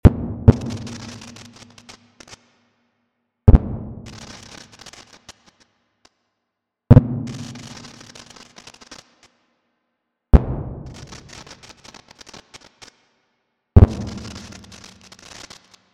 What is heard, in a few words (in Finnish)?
ilotulitus soundit